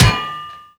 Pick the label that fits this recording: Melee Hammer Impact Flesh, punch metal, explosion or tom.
punch metal